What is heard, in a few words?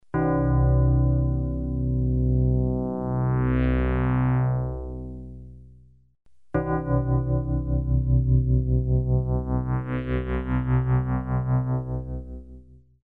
DX7 Tubular; keys; elektro